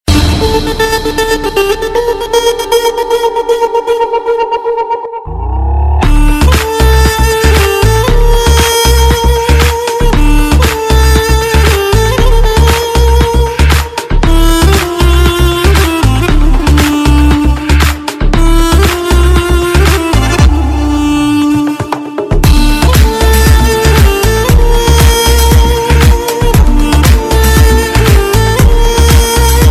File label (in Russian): Vostochnaya melodiya